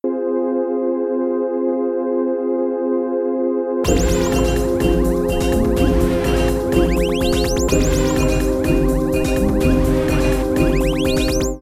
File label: hope jingle